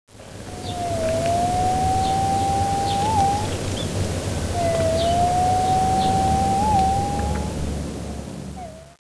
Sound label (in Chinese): Treron formosae formosae, 台灣特有亞種; Song; 低沉、悠遠的「呼唷—喔唷喔」; Teruo Furushima, Wild Bird Songs in Japan, 日本